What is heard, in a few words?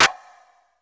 GATE CLAP; Crypton; DrumBase5000